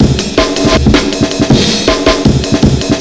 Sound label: amen, breaks, breakcore